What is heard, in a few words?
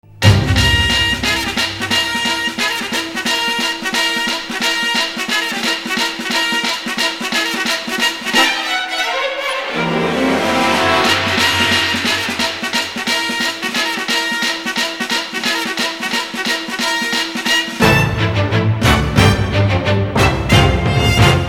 Horse Ride; escaflowne movie